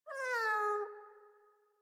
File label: Kitten Meow Playful; Enemies; Warframe; Catbrow